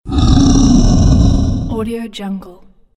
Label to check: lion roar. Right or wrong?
Right